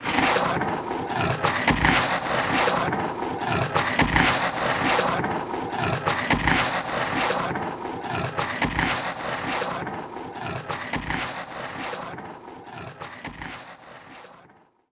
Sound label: scrape on wall, Player Braking